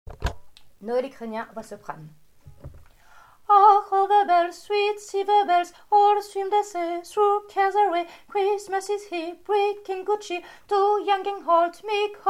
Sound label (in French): soprane; Noël Ukrainien